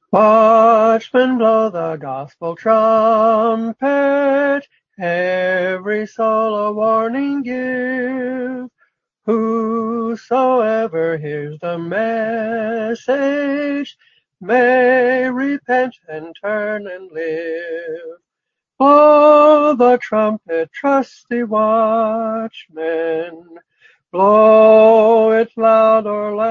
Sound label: Blow The Gospel Trumpet